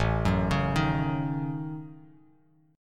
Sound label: A 6th Flat 5th, Bass Standard, A6b5 chord; arpeggiated; JGuitar